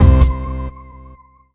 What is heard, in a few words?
blart high ring hit; Weird and Interesting Hits